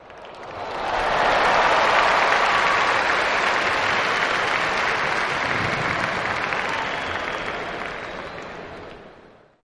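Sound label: Applause Cheering Large Crowd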